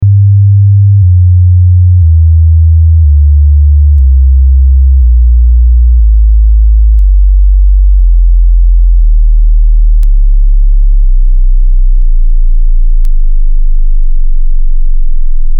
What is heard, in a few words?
Bass Test